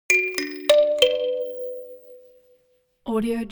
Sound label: ui notification kalimba rattle sequence minor